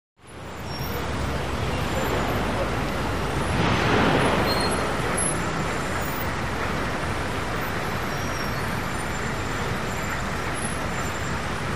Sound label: Heavy Tunnel Traffic, big city